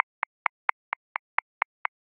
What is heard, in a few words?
Dnb groove; Sawkagroove; Ableton; Factory Packs